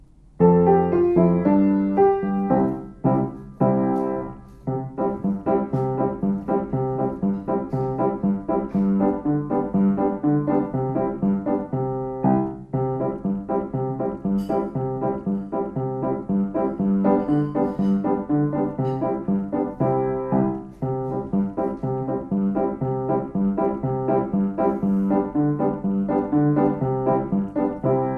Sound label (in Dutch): improvisatie deel zelf ritmes bedenken